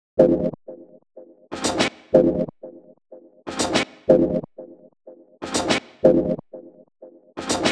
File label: 123 F-min Disco Filter Combi